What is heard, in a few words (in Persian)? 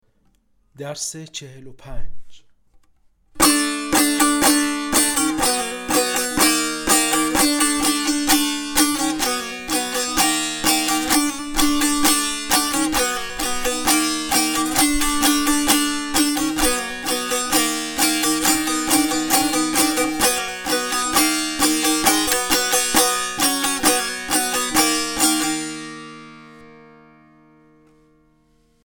مشق تنبور